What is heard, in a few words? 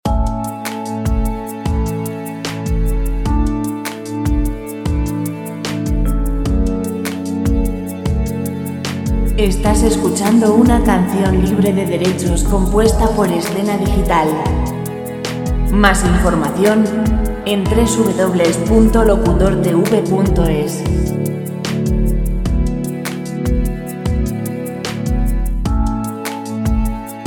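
75 lento royalty free music; relaxing music background music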